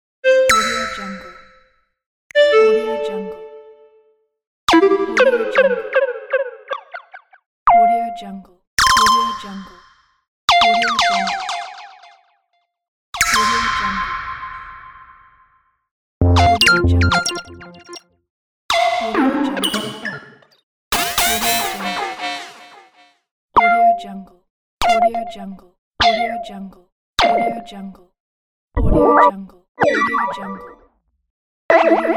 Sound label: beeps interface fx